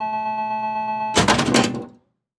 Electronic Pinball Machine Buttons And Beeps Short; AdobeCC